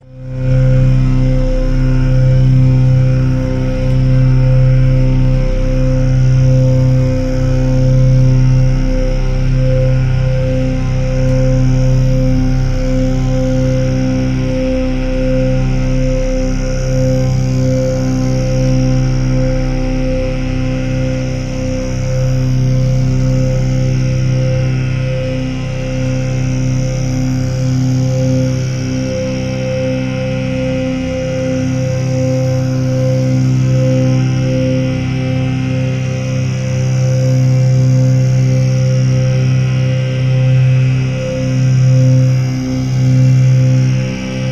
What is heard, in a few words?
Electronic Recordings From Maui Jungle; Editions Mego